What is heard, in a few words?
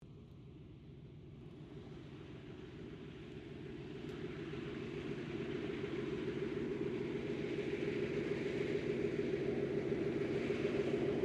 AIRCRAFT LOCKHEED HUDSON TAXI, Hudson twin engine light bomber; Airplanes (Sound Effects:Vehicles)